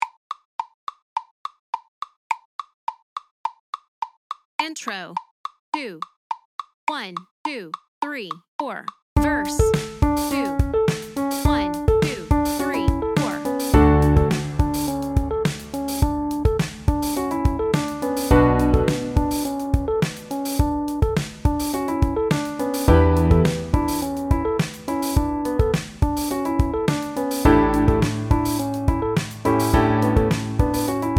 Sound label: backing